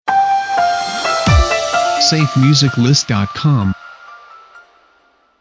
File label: Pulse Stinger; SafeMusicList